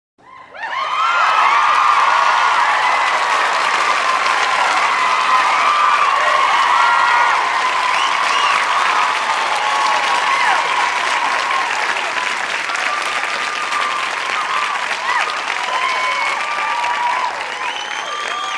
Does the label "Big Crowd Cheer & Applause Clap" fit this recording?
Yes